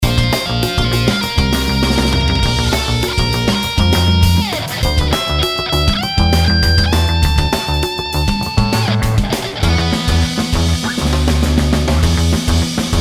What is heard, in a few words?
BGM